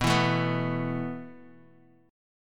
E Suspended 4th B

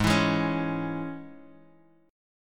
Ab Suspended 4th Sharp 5th Ab, Guitar Standard; 4,7,6,6,x,x; strummed; JGuitar

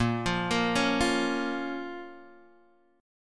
A sharp Minor, Guitar Half Step Down

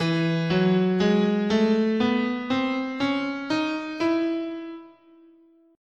E Bebop Major, scale

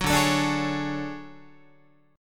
D Major Sharp 11th, D, down, Guitar; strummed; JGuitar

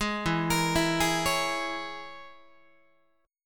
E 6th Flat 5th E down Guitar; E6b5 arpeggiated; JGuitar

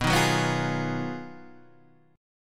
Ab Minor 13th, B, guitar chord

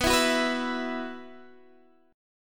B Suspended 4th, Guitar, Drop D

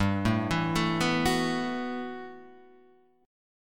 B 7th Suspended 4th G; B7sus4/G; arpeggiated; JGuitar

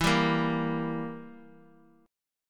E Diminished down

